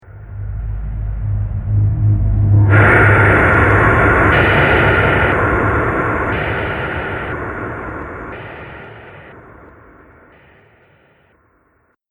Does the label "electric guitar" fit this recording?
No